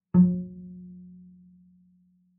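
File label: Bass pizzicato fortissimo sul D Gb2, Double Bass; MIS Pitches - 2014; Strings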